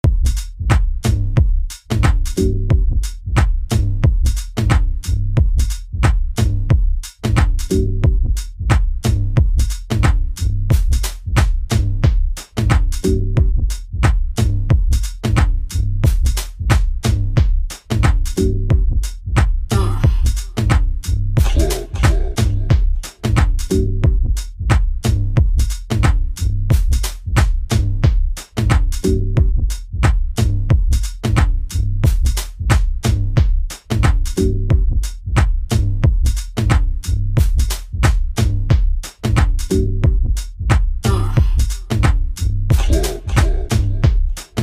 Ugh Get Loose, Musical Beats; smirksmilegrin games

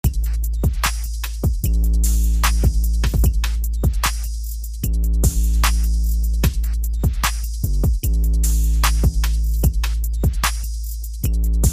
Bring Back The Clap, Musical Beats; smirksmilegrin games

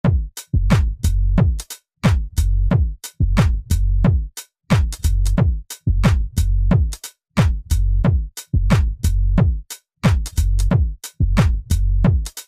Loop it, Musical Beats; smirksmilegrin games

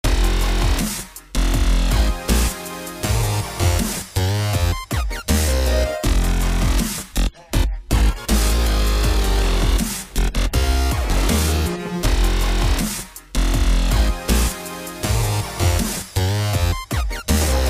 Jam, Musical Beats; smirksmilegrin games

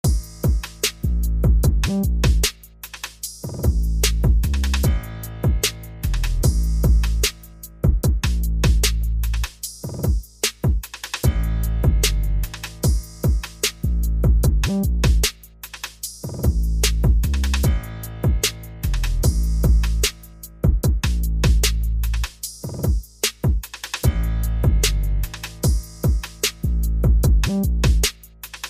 Its Chime for you to Leave, Musical Beats; smirksmilegrin games

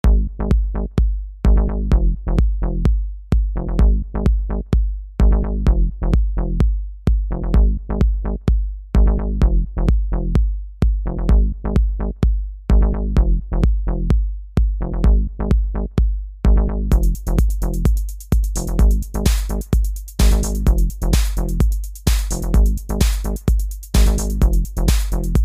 Simple Beat; smirksmilegrin games

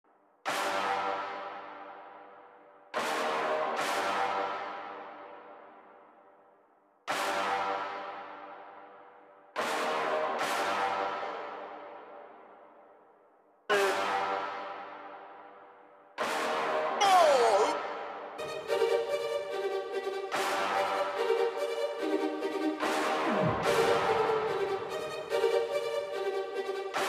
Musical Beats; smirksmilegrin games

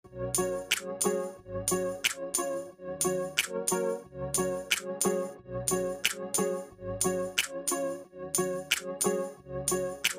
Pixie Pop, Musical Beats; smirksmilegrin games

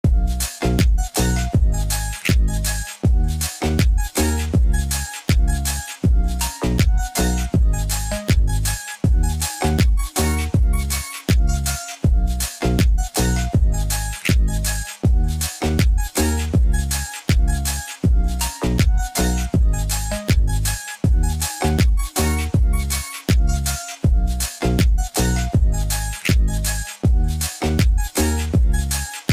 Bees Vibe too, Musical beats; smirksmilegrin games

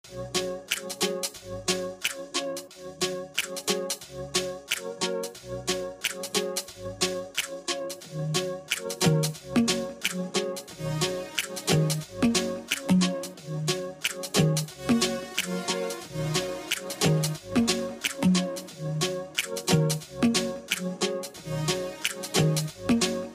Dance in Drops

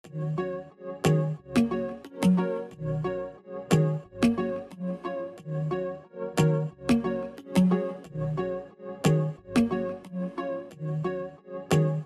Strolling in Rain Drops, Musical Beats; smirksmilegrin games

